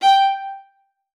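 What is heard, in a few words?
G5, viola spiccato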